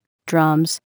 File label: Drums